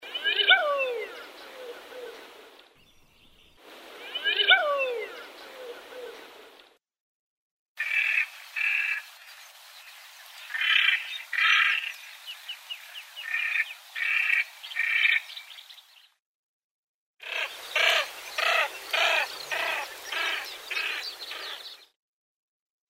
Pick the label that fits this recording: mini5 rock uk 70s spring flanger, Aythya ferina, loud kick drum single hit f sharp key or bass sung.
Aythya ferina